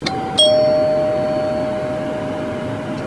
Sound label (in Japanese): bus pinpon; ryobi